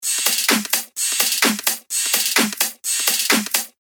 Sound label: 128 BPM